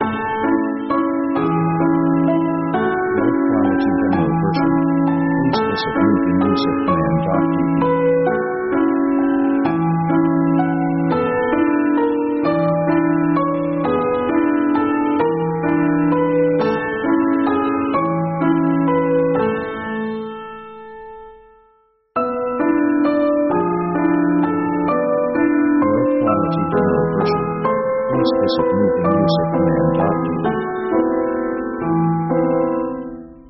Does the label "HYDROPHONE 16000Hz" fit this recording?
No